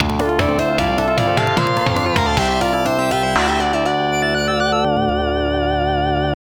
Stage Complete (FM)

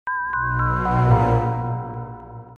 Treasure Room Enter

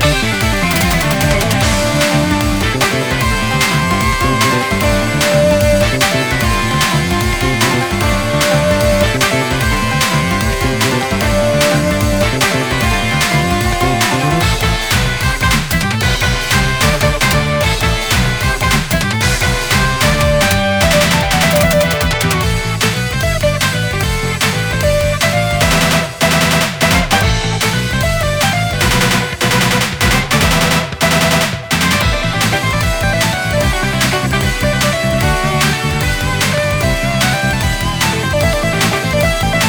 Jungle Battle; Neo Kobe City

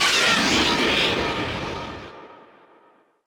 Login Decided; beatmania IIDX 12 Happy Sky; Arcade, PS2

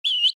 Monsters Fetus Whistle; SFX; The Binding of Isaac

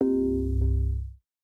App PickUp

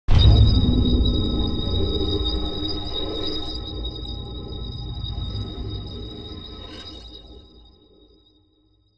stinger apartment body

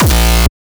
Speedkick 261 A#

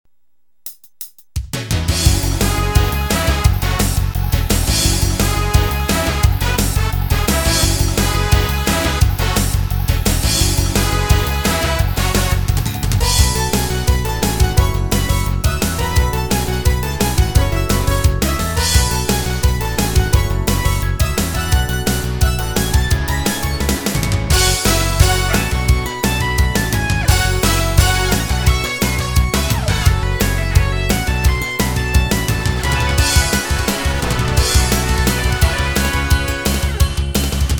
Doppler's Lab Stage 1 and 2

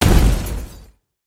Smash; Baron

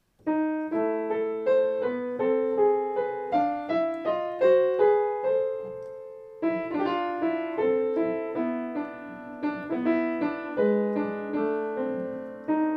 Dungeon

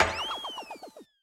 Slime Disarmed Stinger

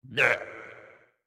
Effort Tiny; Hustlebonez Effort Tiny New

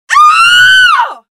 woman scream; gamerip; Wild West Shootout

